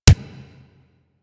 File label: Pistol Punch Initial, weapons; Warframe; Thanotech